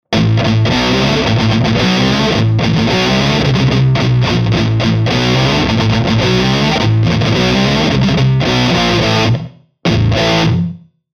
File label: Beast ringtone